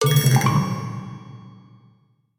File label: gripe; messageboards